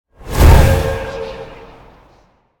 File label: angelic swing; smite; thanatos